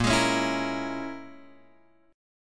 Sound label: A sharp Minor 7th Flat 5th A sharp, Guitar Open F; A#m7b5 strummed; x,5,8,8,7,5